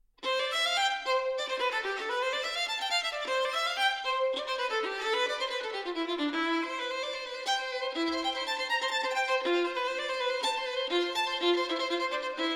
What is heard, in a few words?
violin sonata in c major allegro assai; violin sonata no. 3; bwv 1005 iv